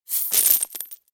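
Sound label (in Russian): ссыпают несколько монет на мягкую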